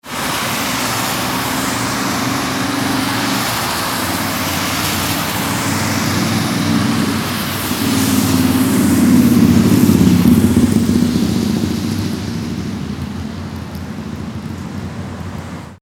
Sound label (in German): Verkehr 4 spurige Strasse